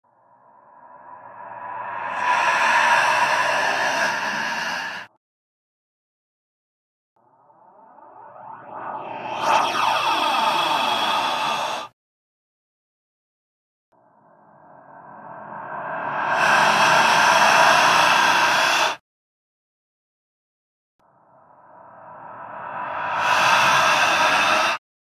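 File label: 4 sounds of scary breathing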